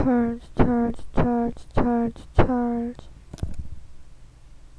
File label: charge